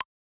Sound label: click, interface sounds; p0ss; hadean